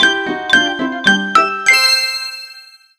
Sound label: Jingle - Sleep; Pokemon Sword Beta